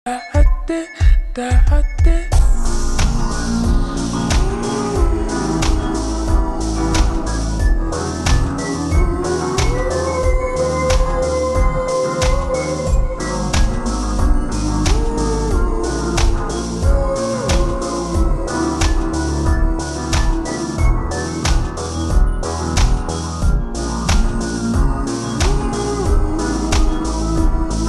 looped slowed

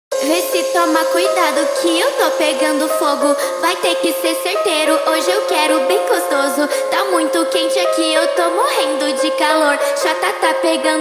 automotivo sped up reverb

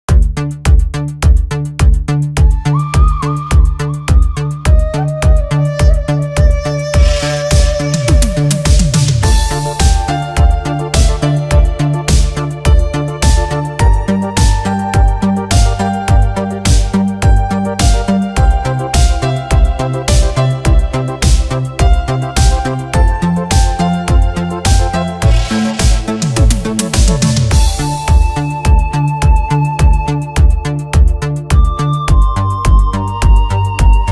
ocarina song, korg style

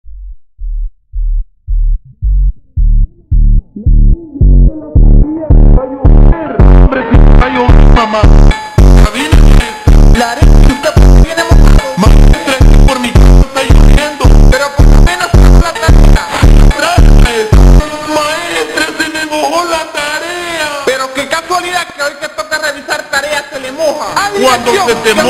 slowed reverb Brazilian phonk

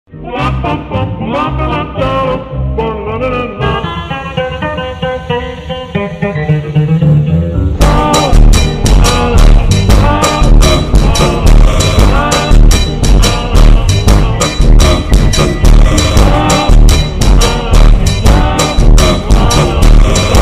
funk slowed reverb

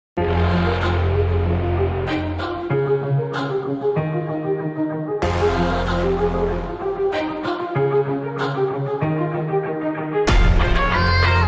beat

